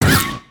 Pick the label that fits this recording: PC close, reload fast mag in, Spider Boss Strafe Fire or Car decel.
Spider Boss Strafe Fire